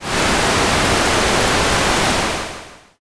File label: fire